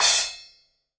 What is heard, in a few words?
DW Green Maple Cymbal Grab; 1999 DW Green Maple Kit; One-Shots; Sonic Foundry (Sony Creative Software); Vital Drums